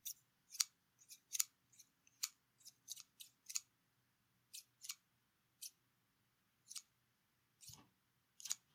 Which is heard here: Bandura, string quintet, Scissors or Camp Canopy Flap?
Scissors